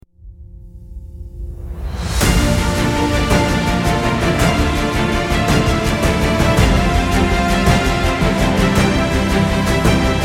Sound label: Epic History